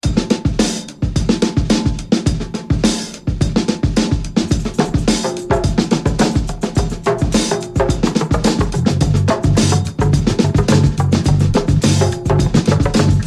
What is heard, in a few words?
duelling drums